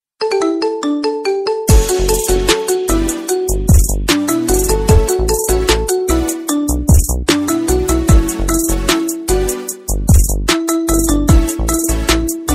Marimba Ringtone Remix

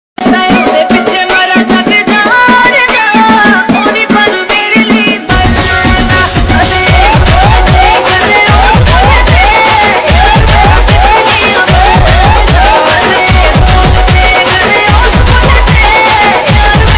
psy Trance

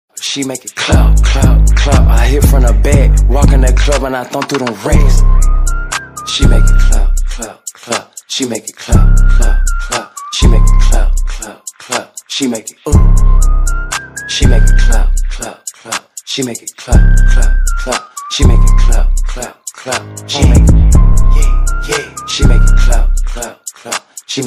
ringtone

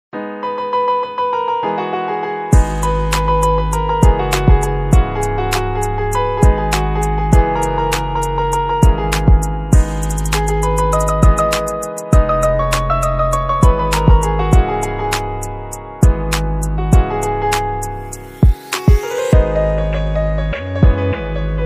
Piano Cover

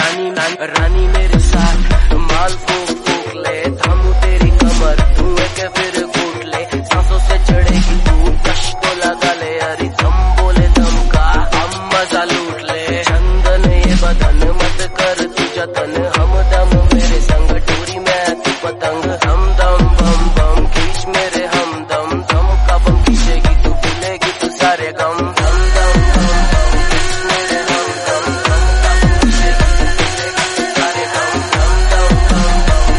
Trance DUBSTEP Remix

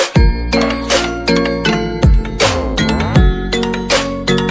Remix Ringtone

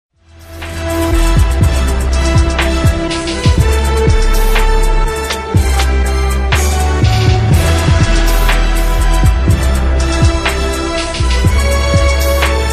remix ringtone; Avengers

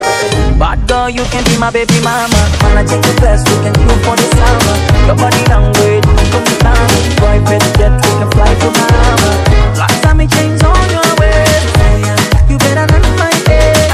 Moombahton Remix

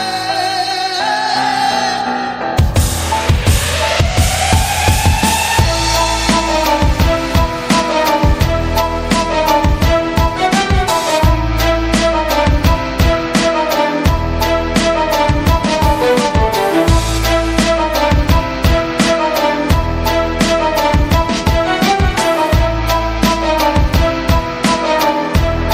GarageBand; Scam 1992

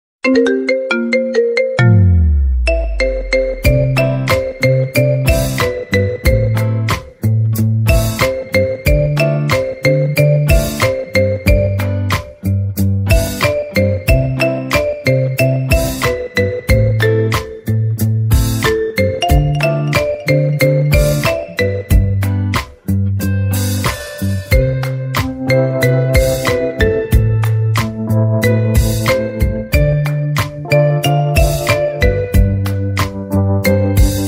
Marimba Ringtone Remix

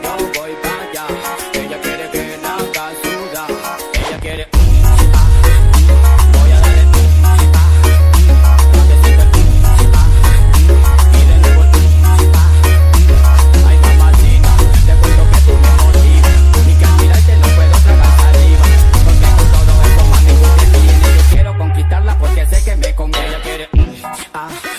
Remix

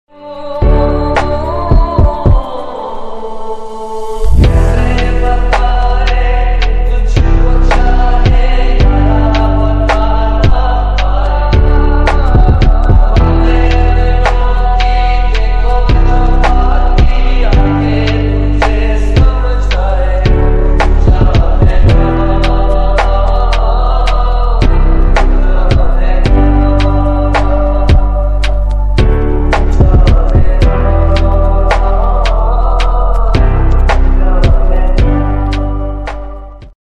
Lofi Ringtone

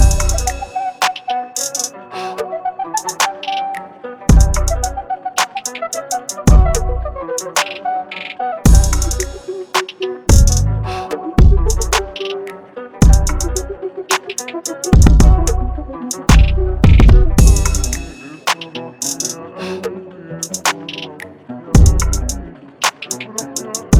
Type Beat

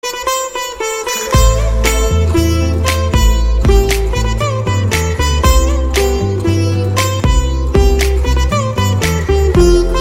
Sitar Cover

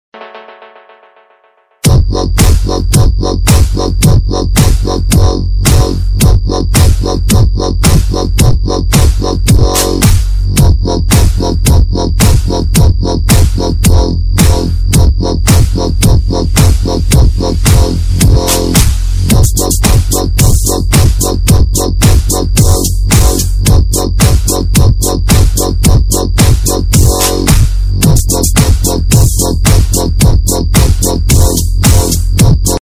Ringtone; Instagram Photo Shake Ringtone

